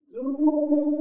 singing flut; project legacy; sound kit; nova sound

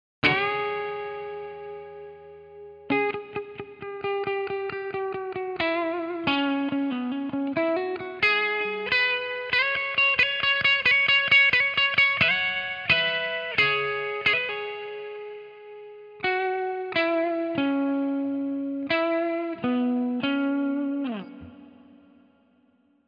les paul wet; chiqui audio

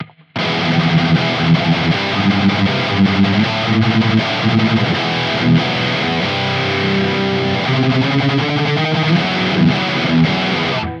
guitar

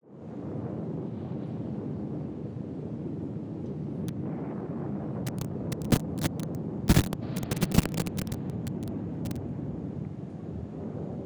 compressed windy rainy bassy; atmospheric; ambience and background fx; nova sound

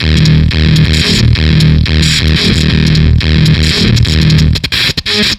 Fuzztest drum frenchtoast

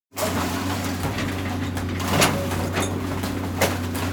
scrapyard hard junk metal; nova scrap yard; junk yard metallic and impact fx; nova sound

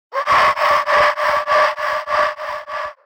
long tail suprised scream shou; music and sound fx; music and sound fx master collection; nova sound

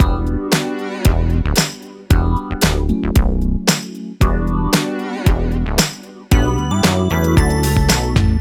city heat bass; synth bass center; audiolounge soft

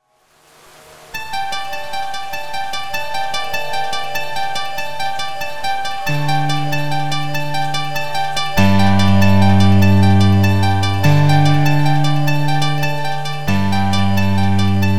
sparkle keys; by super synths

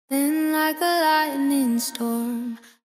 reverse reverb dry; bixlab

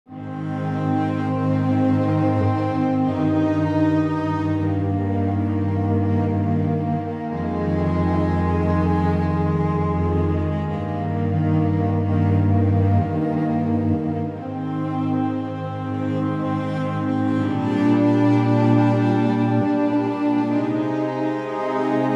strings, subtle move; bittersweet preset; whisper strings; bunker samples

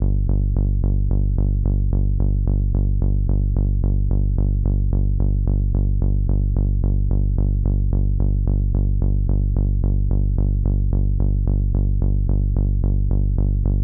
bass solo; deepperfection

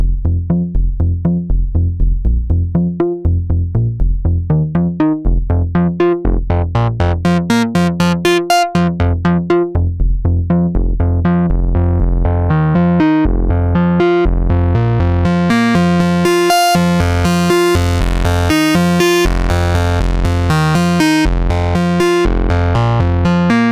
bass pulse

